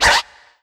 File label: Scratch Pull; Korg N1R